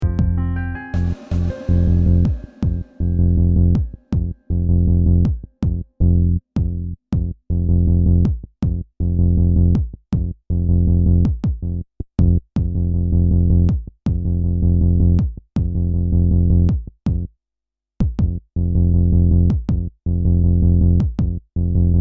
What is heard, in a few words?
Glitchy